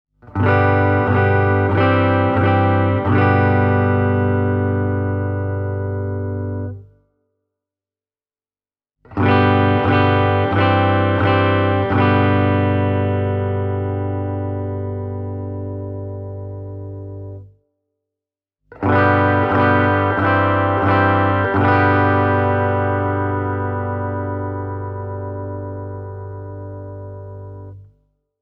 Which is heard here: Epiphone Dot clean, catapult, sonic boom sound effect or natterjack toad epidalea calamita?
Epiphone Dot clean